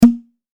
卒業証書入れポンッ

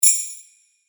ジングルベル 単体

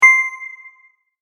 決定

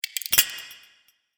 凍る

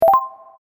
正解

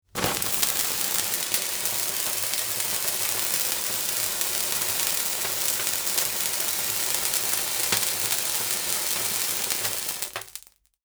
手持ち花火

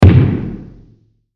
大砲

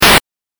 8bit ダメージ

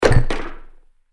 扉が開く

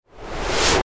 高速移動

